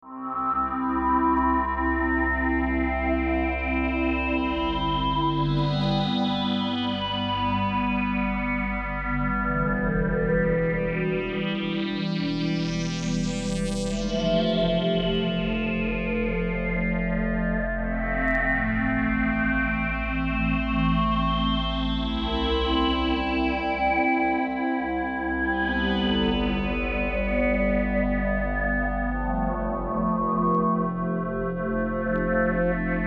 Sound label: mod pad